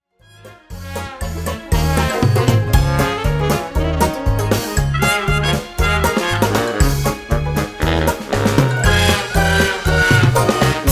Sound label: audience music